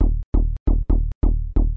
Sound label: juno synth perc bass; BASS LOOPS